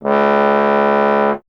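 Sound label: bass trombone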